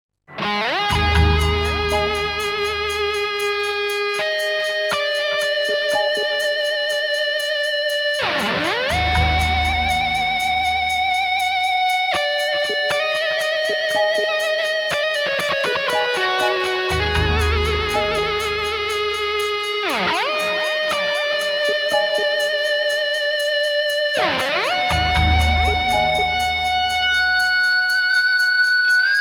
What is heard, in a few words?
variations (guitar); mona theme